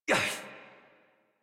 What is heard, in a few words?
Pain Adult; Warframe; New War; Adult Operator